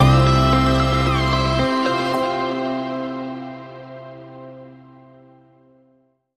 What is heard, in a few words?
Bumper